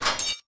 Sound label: vendor vend; zegaton game